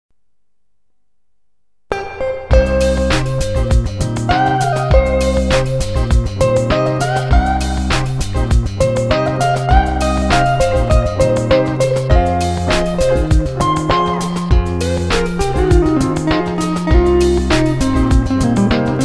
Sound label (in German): C-moll